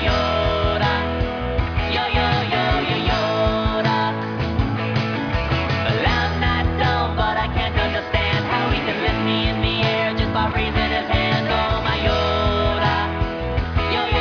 jazz

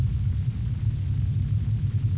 alien noise

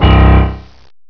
horror end; friendly

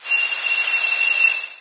station train squeel; hl2; plats

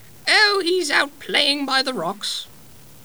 girl, playing

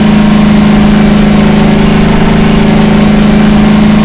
jeep loop

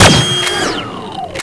hyperblaster fire

